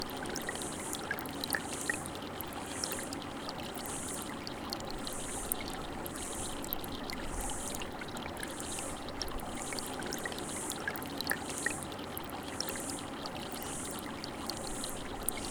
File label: Spa Music Zen Relaxation Meditation Rain Sounds